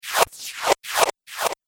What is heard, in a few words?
Droid Suction Cups, robot